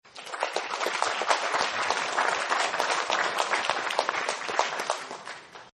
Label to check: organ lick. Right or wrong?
Wrong